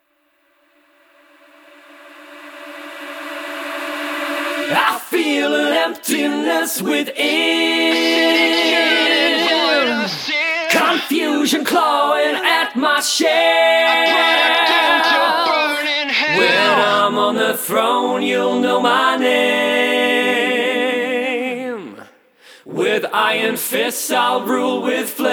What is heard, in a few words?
Boss Fight Vocals; Spark the Electric Jester 2